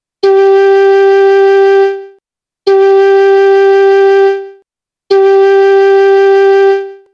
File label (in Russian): 2 oktava 5 sol flejta